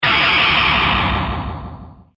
Loud Crashing Impact; Miscellaneous Impact Sound Effects; Andrew Fleming

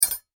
impact metal small; Materials; McKinney Sound

